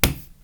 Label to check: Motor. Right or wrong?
Wrong